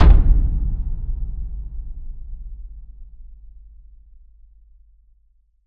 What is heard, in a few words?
Impact Boom Thud; bottlerocket